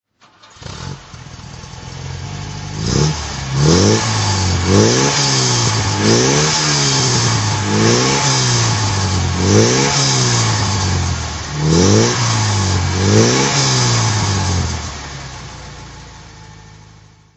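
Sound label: lancia flavia throttle, Engine sound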